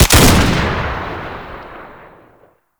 Sniper Drone Shot; Warframe; Lasrian

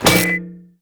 idle head impact; Warframe; Fortuna; Zuud

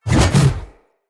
Spear Spin Whoosh; Weapons; Enemy; NimrodSpear

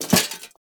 Pickup Helmet; Warframe; Cephalon Weave

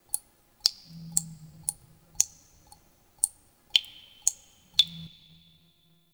Terrarium Drips; Warframe; Ambience; Entrati

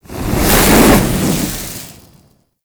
Inferno Wildfire Start; Warframe; Ember

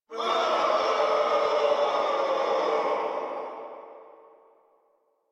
Crowd; Warframe; Rathuum; Stage Three; en

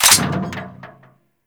Turret Mech, Grineer Crew Ship Turrets; Warframe; RailJack

